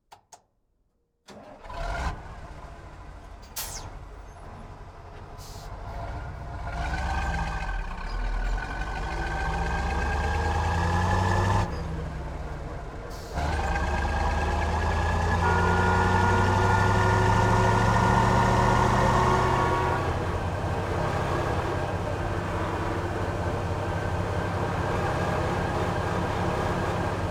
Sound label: Truck Ural Start Idle Fast Drive Away Approach Stop Horn Exhaust Pipe, URAL 4320 Diesel; FLYSOUND